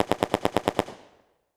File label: Weapon Machine Gun World War II Historical Long Burst Distant; AdobeCC